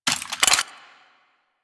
Pistol Reload; weapons; Warframe; Thanotech

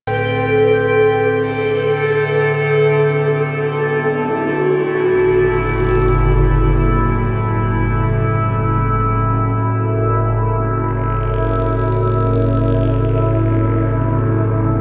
Spawn Music; Warframe; Dark Sector

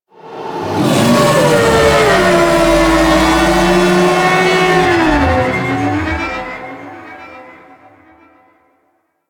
Vocal Witness, Enemies; Warframe; Teralyst; DE

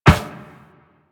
Run Metal Perforated, Footsteps

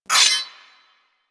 Melee Impact Metal; Warframe; Weapons

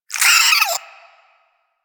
Vocal Pain, Hunting Critters; Warframe; Cryptilex

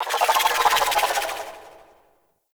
Ship Mechanical Textures; Warframe; Corpus Ship Remaster

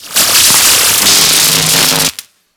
Ocean Electric Surge Large; Warframe; Grineer Ocean

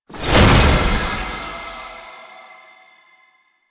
Companion Resurrect; Warframe; Tenno

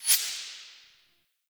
Guard Break Proc; Procs

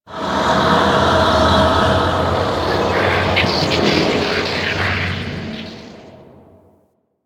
Disturbance, Ambience; Warframe; Entrati

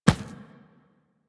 Inf Medium Run Default, Infested Footsteps; Warframe

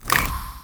Infested K Drive Jump Ready, Vehicles

